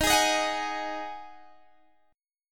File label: Eb Minor Double Flat 5th, down, Guitar, DADGAD